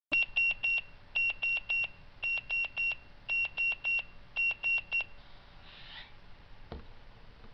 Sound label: Tone C, Watchdog Alert; OSI